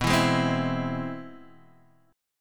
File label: C Augmented Major 7th B